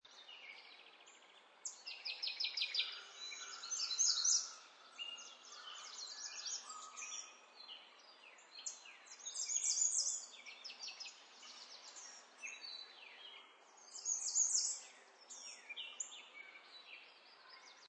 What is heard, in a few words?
Nature Birds Morning Loop; Lynne Music